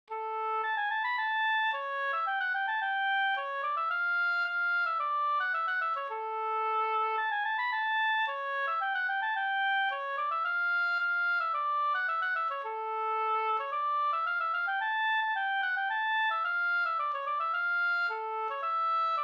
polska Bingsjopolska

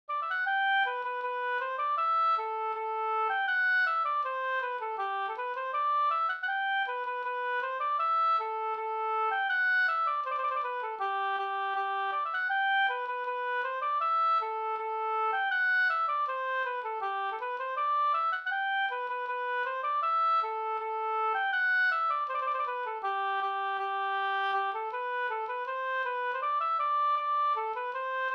schottis; Grastorp Vg

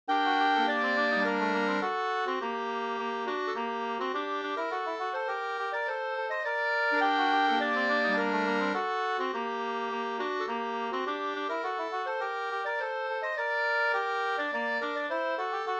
slängpolska

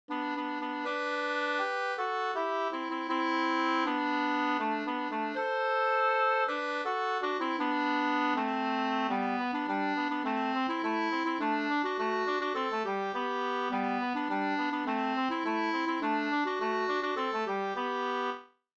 folkdans; Aj mitt hjarta ar borta

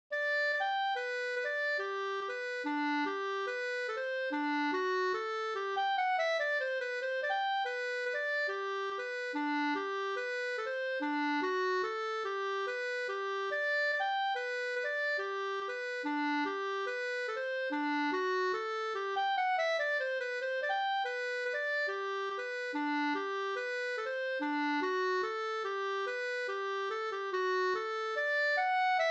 reinlender G dur; e CG Malmberg

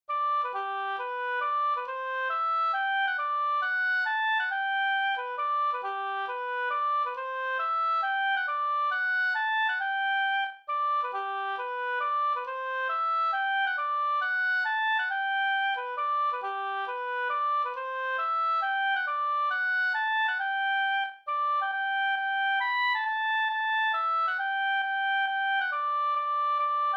mazurka; Lunkaren Lotlax Vora fi

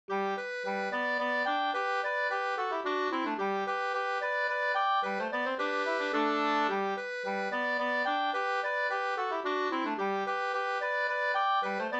slangpolska 2st

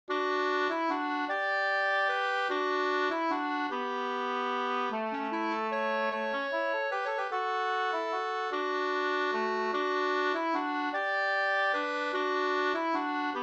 vals Brudvals

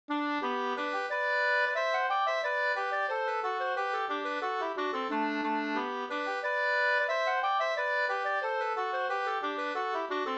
engelska

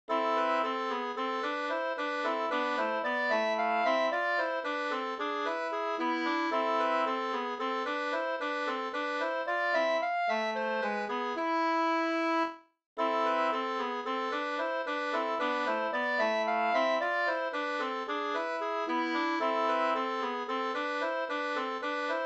skanklat

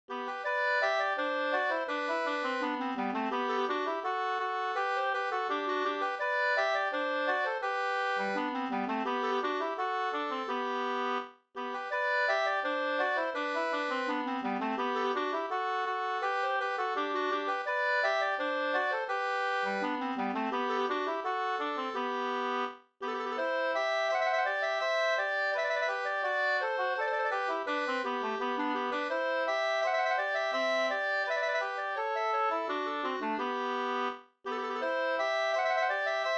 brudmarsch

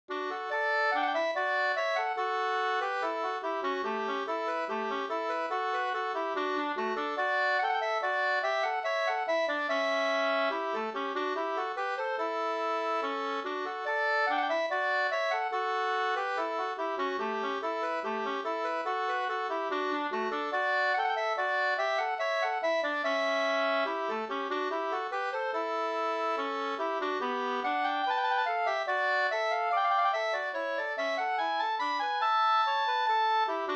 ganglat; e Dalfors Ore SvL

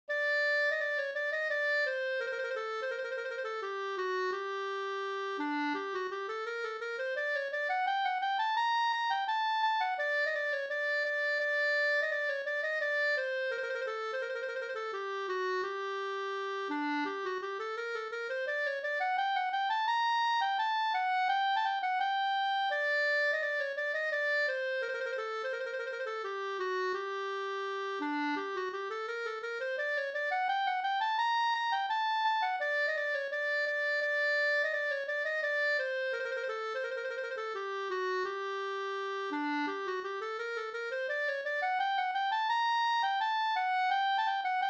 brudmarsch

